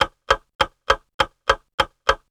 Bomb Tick 101 bpm; Loops; FX; Ableton Live 12 Lite; Core Library

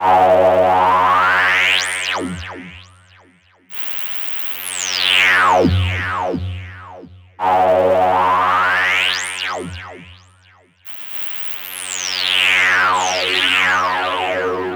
Moogish Lead; Sony; ACID Pro 7.0

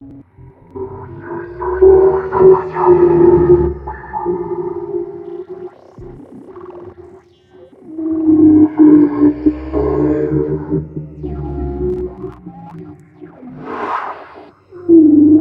Guardian Mech Loop; Journey

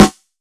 Snare Fat Hand Drummer, drums

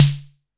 Snare; Ableton Live 12 Lite Core Library

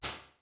Glass Shower; Convolution; Small Rooms; IR Samples; FIR

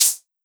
Shaker Sweep; Drums

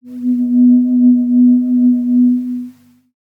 Bowl Swell B1

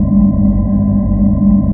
hoverbug ground; There; ThereClient

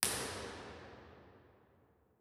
Real Places, Impulse Responses; Soulac Front R; Ableton Live 12 Lite; Hybrid

